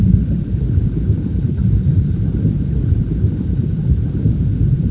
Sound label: lava flow; dystopia